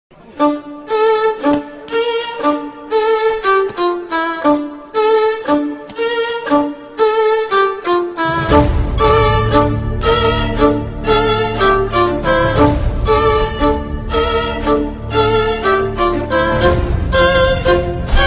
violin bgm interval bgm telugu bgms background music; nannaku prematho